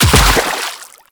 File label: Land Water; Warframe; The New War; Kahl; Footsteps